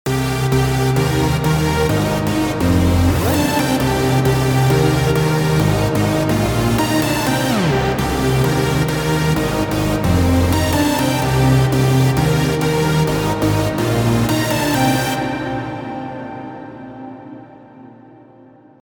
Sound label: Reverb - Synth Lead; CRTIV Reverb; Synth Lead 1 - Thunder